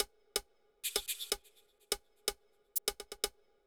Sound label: ethnic groove 125